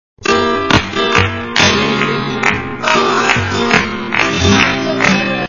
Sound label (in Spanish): buleria SLOW